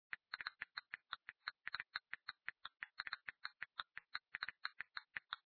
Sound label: Castanets